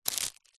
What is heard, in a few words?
foley unopened greetings card in cellophane wrapper pick up; zapsplat